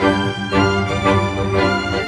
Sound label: Orchestra, Rock Pop